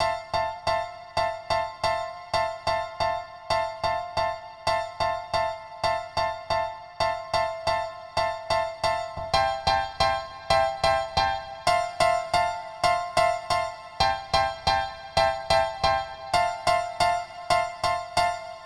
Dance Piano; super crush k.o.

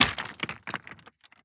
masonry break

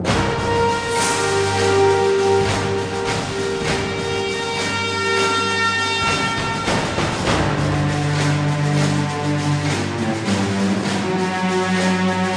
Action

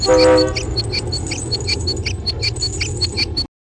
BIN OFF

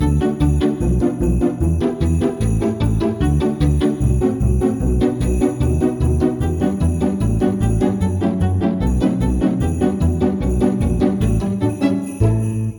Knock Music

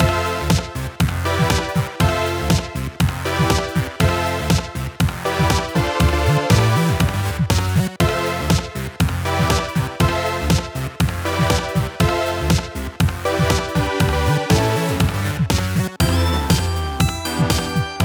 Lobby; Inkopolis Square; Splatfest, Left

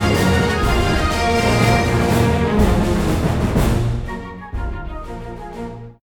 mode total; DW How to Train Your Dragon

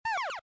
Enemy Damage; 8-bit Bayonetta

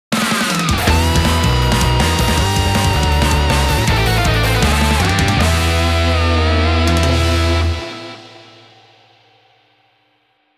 Battle Success; Advance Wars 1+2 Re-Boot Camp

